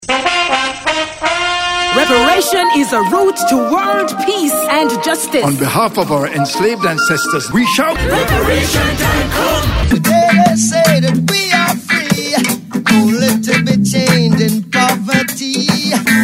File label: Jingle; Generic; Reparations